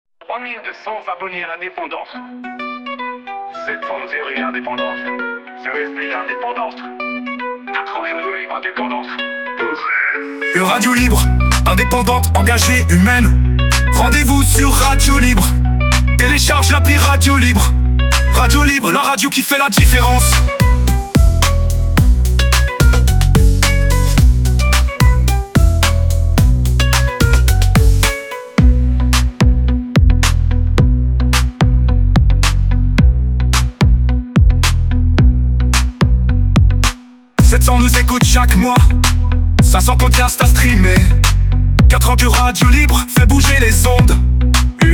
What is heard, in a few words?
promos songs Culturel Afro Trap; Radio Libre